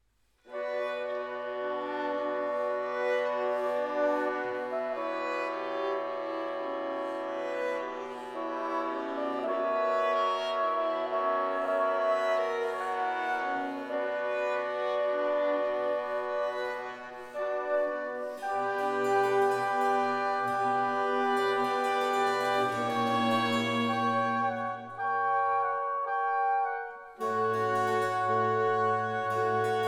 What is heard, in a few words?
Ouverture C Dur Sommeille